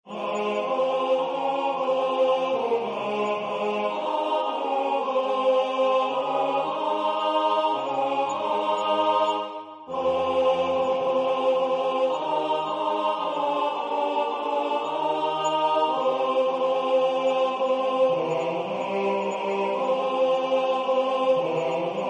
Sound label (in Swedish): Folkvisa från Gotland tenor